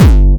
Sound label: BD Distortion, kicks; VEC1